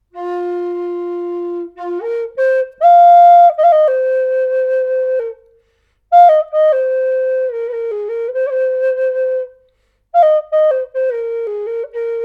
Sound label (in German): Flöte NAF art Stimmung F 440Hz